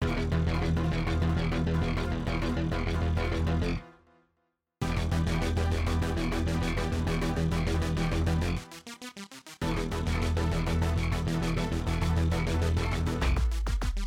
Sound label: battle; pokerogue